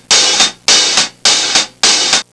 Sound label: hi hat series, percussion instruments; Sound Effects for Multimedia - Volume 1